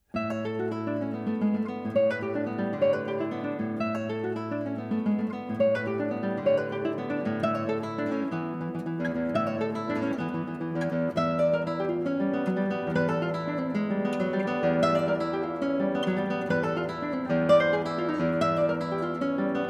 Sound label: Guitar Solo